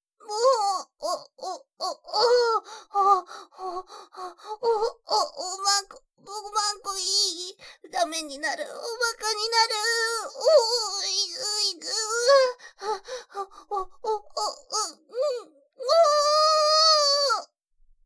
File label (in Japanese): バイノーラル んおぉっ おっ おっ ほぉっ